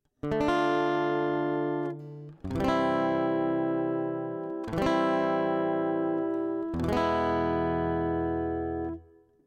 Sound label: Chords